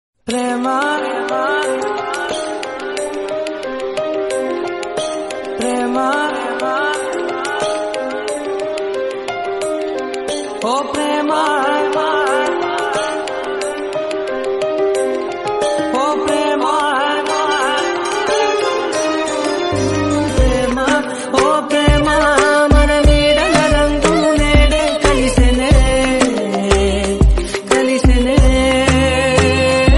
telugu song ringtone and bgm; ngk

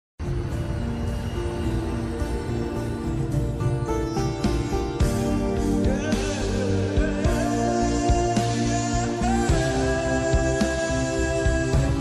most eligible bachelor interval bgm ringtone

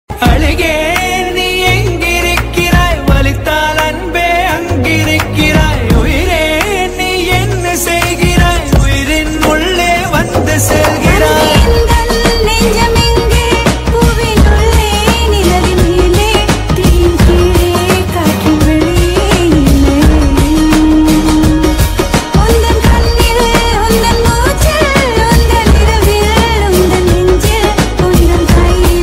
mobile ringtone cut song tamil ringtones

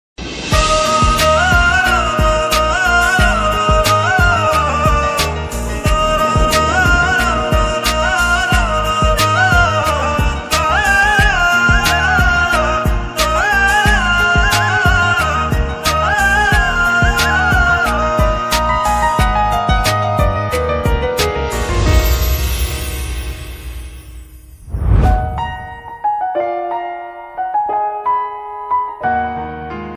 song ringtone punjabi ringtone

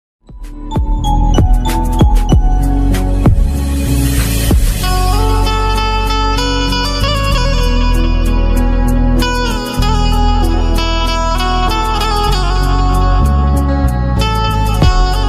instrumental ringtone hindi instrumental ringtone

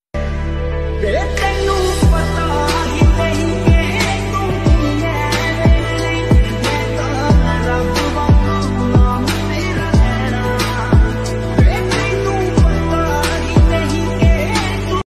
sad bgm ringtone

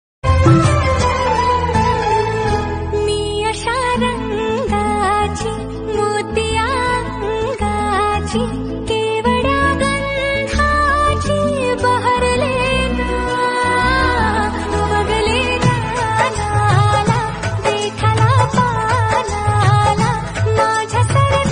marathi ringtone

